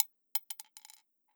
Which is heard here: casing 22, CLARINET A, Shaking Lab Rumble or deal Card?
casing 22